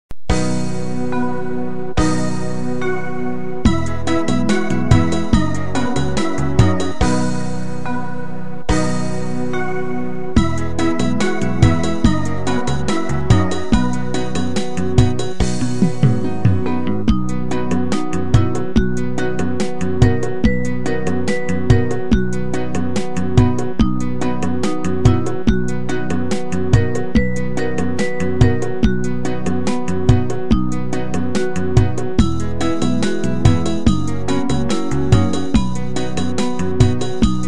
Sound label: Karaoke; Gundul Gundul Pacul